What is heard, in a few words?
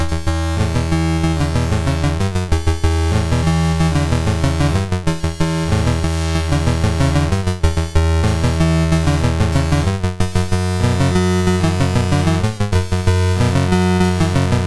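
Magicians Ball, MOS 6581 SID chip music, games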